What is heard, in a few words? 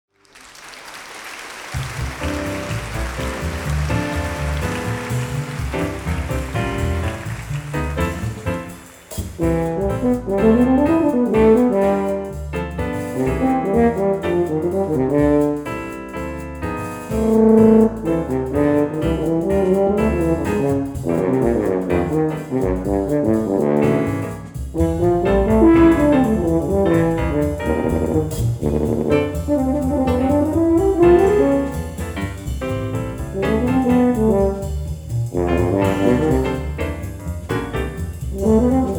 Buzzing Bumble Bee; VSL